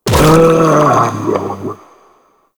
Inked Humanoid Large Death; Enemies; Warframe; Shadowgrapher

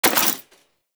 Mech Alt, Rifle; Thanotech; Trumna Prime

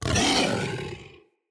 Kubrow Feral Vocal Angry Attack Charge

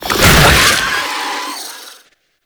Undead Owl Attack; Warframe; Dante

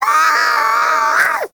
Sea Bird Tranquillized; Hunting Critters; Ostron Sea Bird

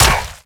Step; Infested; Zealoid Prelate

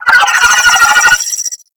Space Drone Attack; Warframe; Corpus Space Drone

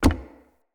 Unarmed Punch Impact Wood Hollow Large; Weapon Impacts; Player; Environment

